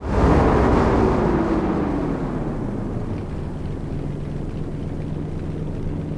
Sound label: throttle off, vehicles; gmod; tdmcars